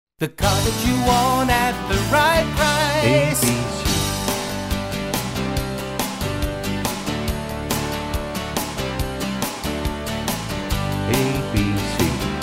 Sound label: Car, ad jingles